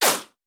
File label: Bullet Ricochet; Sound Ideas; Guns